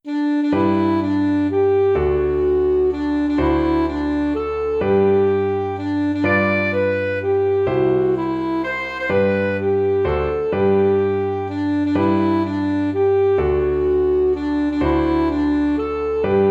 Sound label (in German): Happy Birthday Geburtstag In G; G-Dur